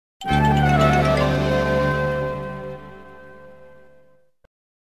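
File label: Sting Start Light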